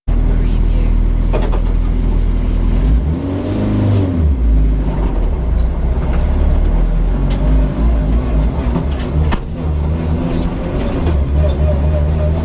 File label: Rally car juddering and squeaking; beanstalk audio